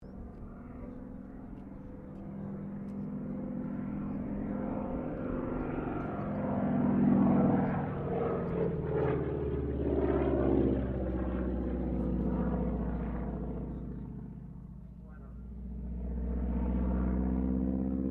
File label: Aircraft CAC CA-25 Winjeel loop, WW2 era Australian training aircraft; Airplanes; Vehicles